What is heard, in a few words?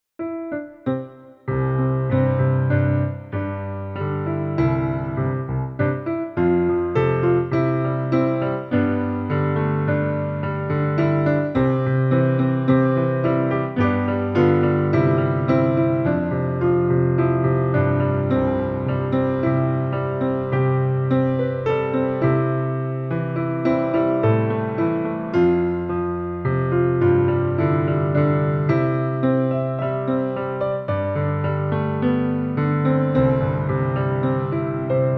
Piano Improv